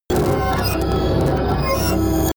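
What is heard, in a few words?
nonsense machine, science fiction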